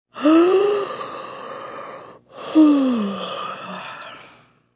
Yawn Long Female

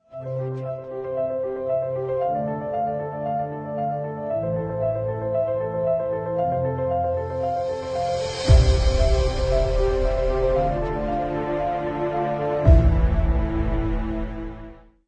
Epic Inspired Corporate; 音乐素材 史诗创意企业

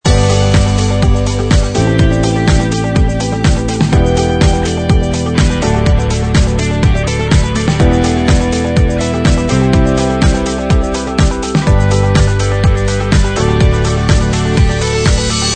Corporate Upbeat Loop; 音乐素材 企业乐观

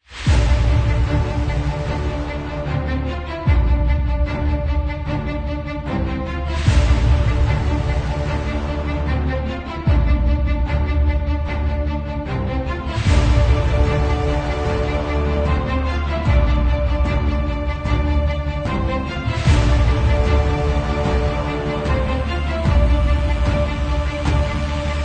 Rising Trailer

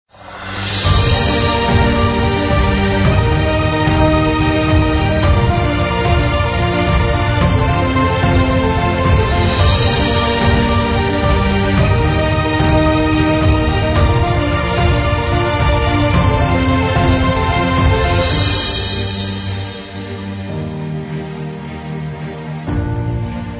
Epic

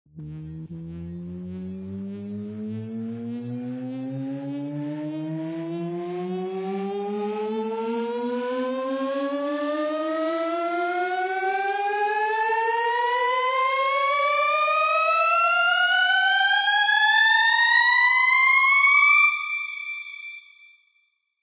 Riser 100 BPM D; Cymatics; FX Essentials

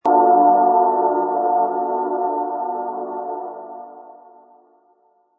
One Shot Airdrop D minor

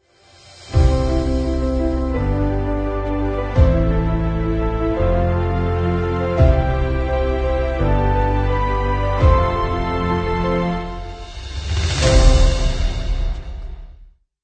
Epic Motivational Corporate

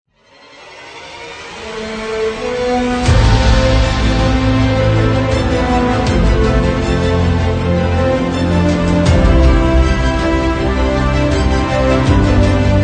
An Epic short

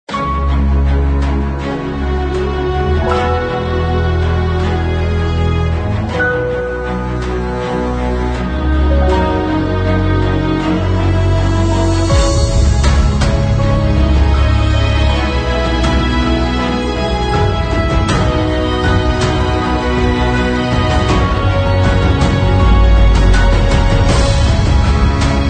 Epic

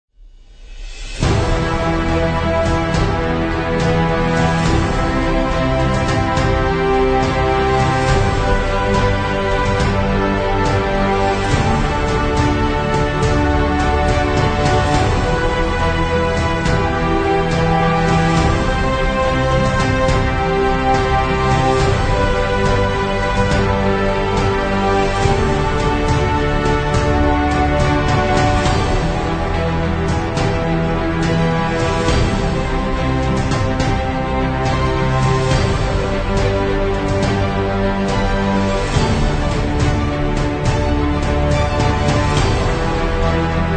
Powerful

